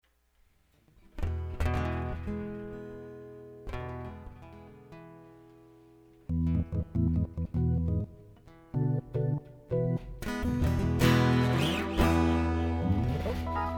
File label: Instrumental